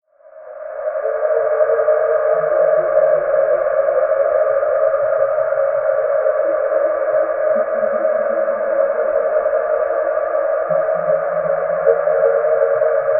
DRONE DARK AIR, Drone Dark Airy Howl Digital Delay; Blastwave